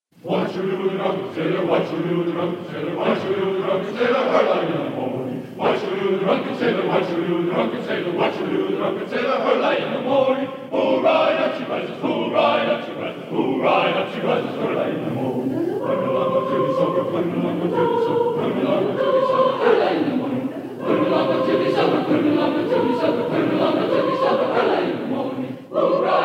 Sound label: Choir; Drunken Sailor (Folk-arr Gregg Smith) USC Pantheon Choir -Pgh